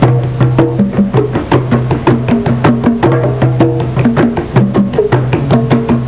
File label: Drum Jam; Museum of Anything Goes